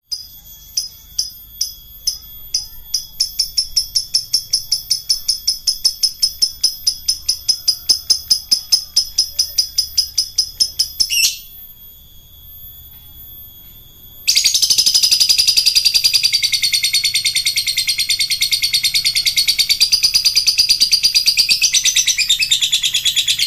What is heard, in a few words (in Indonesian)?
suara lovebird ngekek